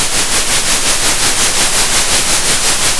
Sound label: center, Sound of a train